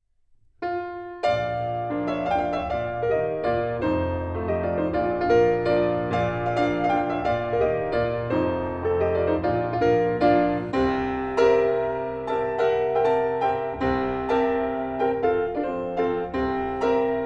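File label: mazurka in b flat major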